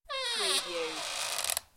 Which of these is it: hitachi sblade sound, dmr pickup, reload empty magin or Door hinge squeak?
Door hinge squeak